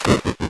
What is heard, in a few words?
Lava Enemy